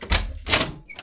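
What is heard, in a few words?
glass open; Mafie 1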